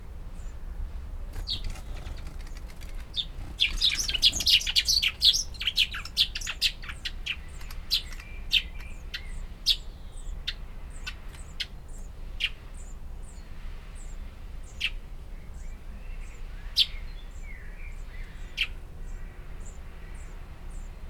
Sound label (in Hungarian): madarhangok mezei veréb Passer montanus